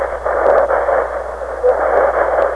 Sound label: chant